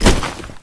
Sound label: push impact; tf